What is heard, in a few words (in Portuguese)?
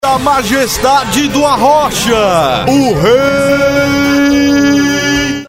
vinheta